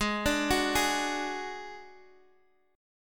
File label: Gb Suspended 2nd Sharp 5th Ab